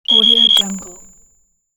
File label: neuro glitch